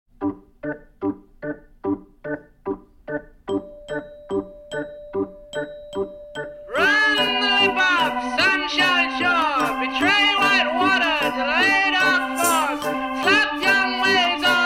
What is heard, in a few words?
clap your hands